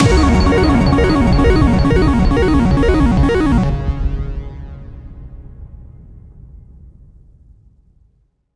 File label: Slot Machine Jackpot